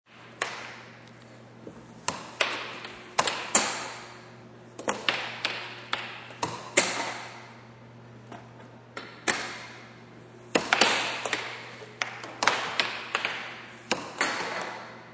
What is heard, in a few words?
Air Hockey, Air hockey game; Part of Air Hockey (Sound Effects:Games)